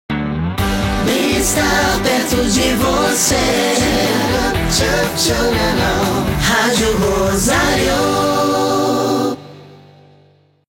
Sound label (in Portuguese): JINGLE, vinhetas; shopping; ROSARIO